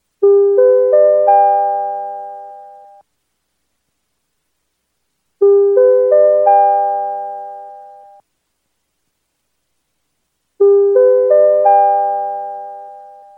House Chime

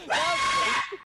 scream

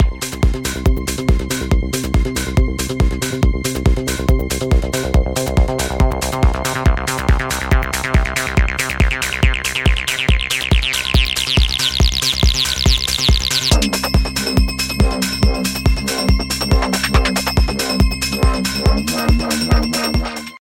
techno trance

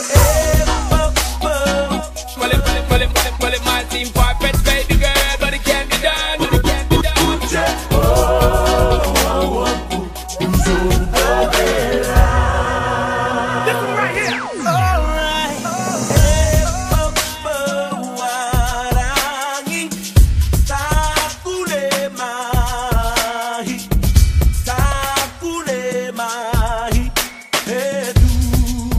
Chant Remix

